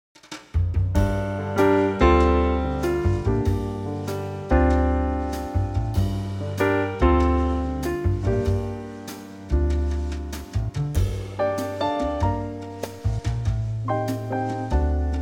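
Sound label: Gm Trio swing, backing track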